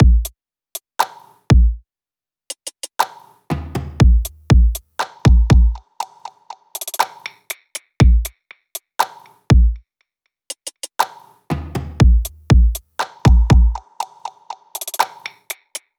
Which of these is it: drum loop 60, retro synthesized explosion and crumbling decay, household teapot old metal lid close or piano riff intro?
drum loop 60